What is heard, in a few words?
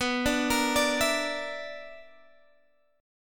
B-Minor Major 7th Double Flat 5th B down Guitar Standard; BmM7bb5 arpeggiated; JGuitar